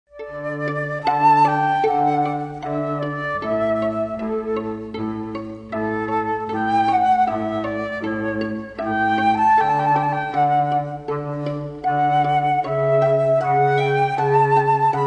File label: Flute Trio; Vivaldi Winter Four Seasons